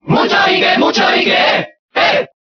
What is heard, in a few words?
Cheer Spanish; Ike; SSBB